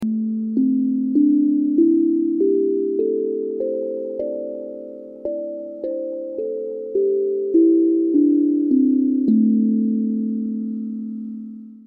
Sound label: haps am, Hapi Drum; Perc-melodisch